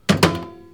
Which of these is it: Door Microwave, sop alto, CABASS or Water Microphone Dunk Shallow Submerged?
Door Microwave